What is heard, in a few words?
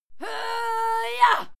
female fight scream yah struggle; schreie; menschen